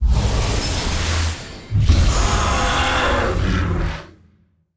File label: boss earth wind; spiritking